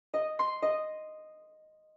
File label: Harp